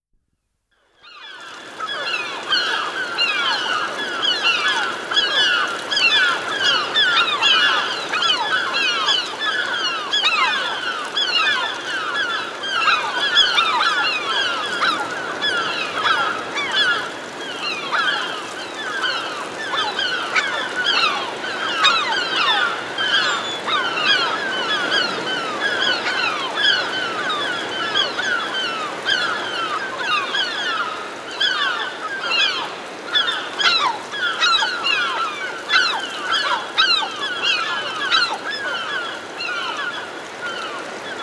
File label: Herring Gulls Hovering Overhead; Dr. Sound Effects; 210 Nature Sounds - 20 Hours of Relaxing Natural Ambiences for Meditation and Sleep